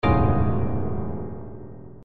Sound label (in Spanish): Acorde super Do